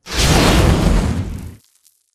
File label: atlas dash close; Titanfall 2